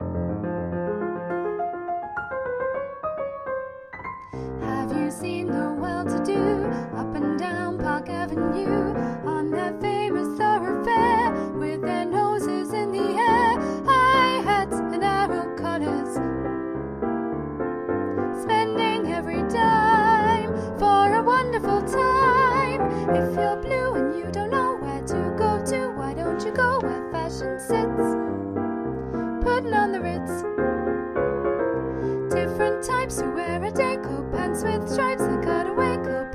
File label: soprano